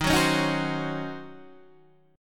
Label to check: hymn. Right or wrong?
Wrong